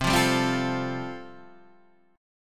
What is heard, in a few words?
C 6th Suspended 4th C, chord